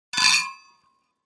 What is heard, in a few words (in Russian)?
zastole korotko